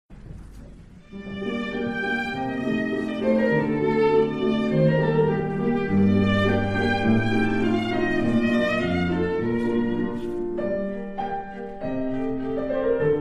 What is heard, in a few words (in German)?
Schubert Sonate G moll 4ter Satz